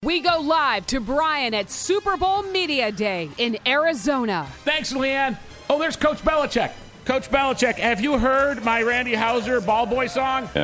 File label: ball boy song